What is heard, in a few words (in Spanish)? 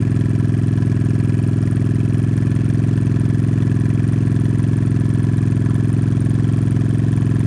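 MOTOR MARCHA YBR, motorcycle; YBR125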